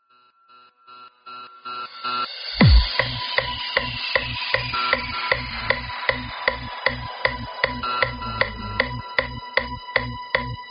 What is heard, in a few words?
kick; multitrax